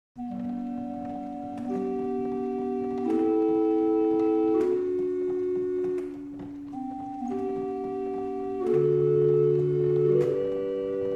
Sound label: barrel organ; Liddington